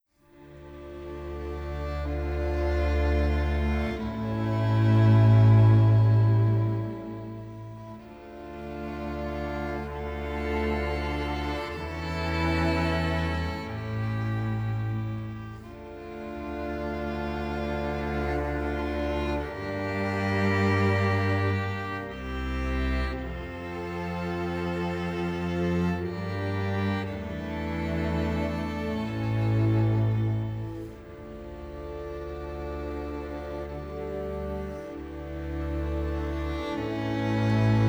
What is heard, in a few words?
String Quintet, Chamber Music